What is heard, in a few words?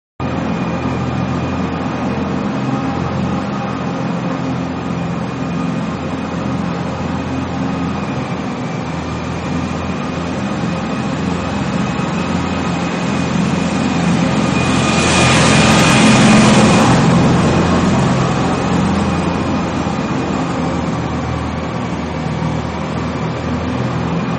Ice Hockey Zamboni passes by from left to right; Hockey Ice-Zamboni-Passes By From Right To Left